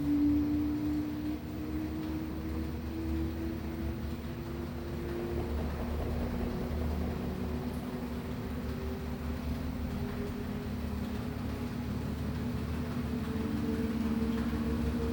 spinning Normal, lavadora samsung; minha lavadora samsung esta fazendo barulho; home-appliances

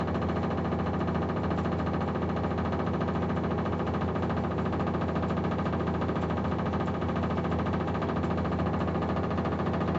drain pump, lavadora samsung; minha lavadora samsung esta fazendo barulho; home-appliances

